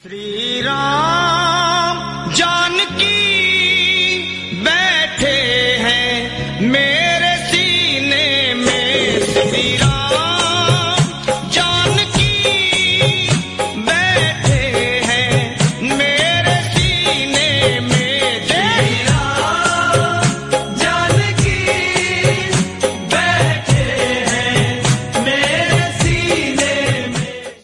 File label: Bhakti Ringtone